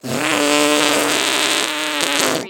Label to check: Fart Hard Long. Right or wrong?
Right